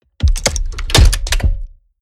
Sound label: mechanics large lock